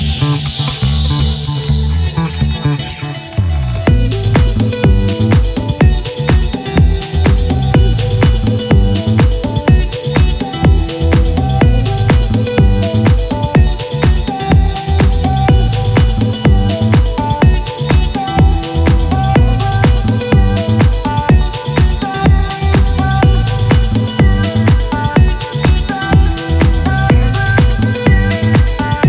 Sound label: Digital Beats